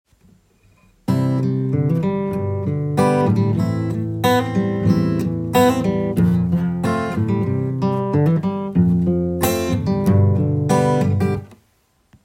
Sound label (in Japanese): パターン2 am7